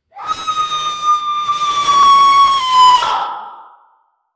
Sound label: ghost screaming, paranormal